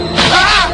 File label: pain; tien